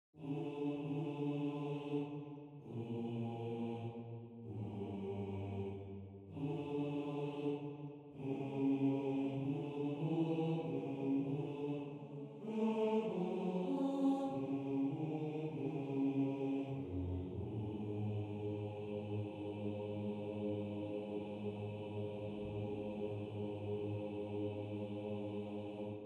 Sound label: Bass